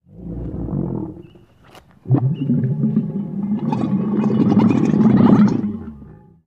Camel Call Grunts; camels

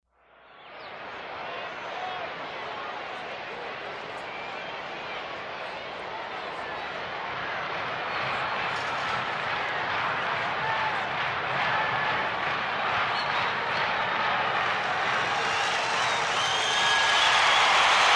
Huge Concert Crowd Qu

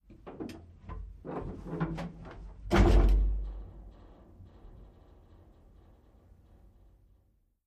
M60 Tank Int Hatch

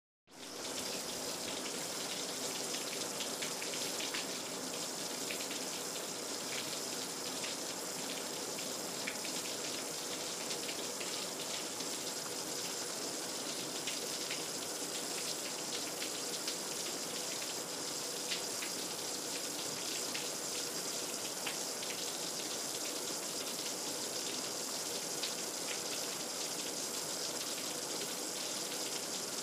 Shower Closeup

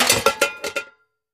Junk Thrown Box, junk crashes

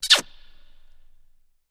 Kiss Echo; kissing

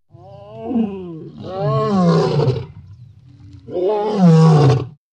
Lion Growl Snore Purr; big cats

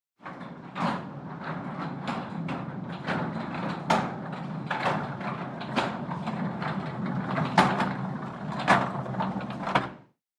Gurney Cart Running; gurneys

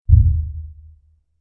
Hatch Thumps Low Hollow, dull impacts

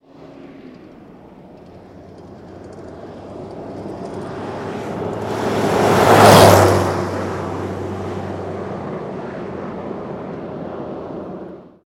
Jeep By Medium, jeep cherokee 84; Jeep Cherokee 1984

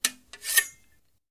Rapier Draw Draw From, swords

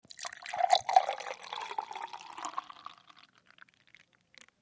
Beer Bottle Pour No Gl; eating & drinking

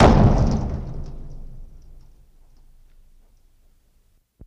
Metal Hit Big Sngl Im, metal impact

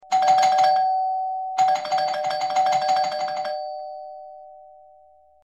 Quick Repeated Ding, doorbell

